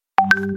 Notification tune

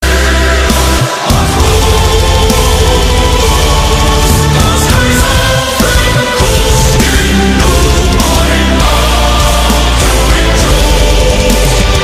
Metal

